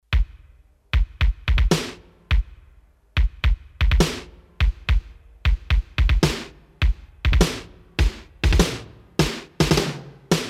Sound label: Drums Set, Roland D-10; Factory Patches